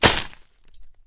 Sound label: limb blunt; Barotrauma; Subsurface